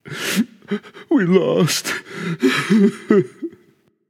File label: boohoo; smite; zeus